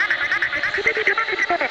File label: techno vocals 140